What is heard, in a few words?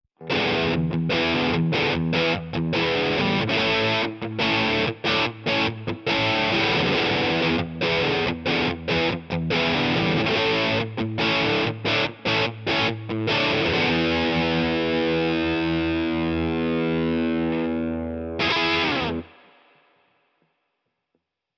Amp Only Drive Reverb; Archetype Tom Morello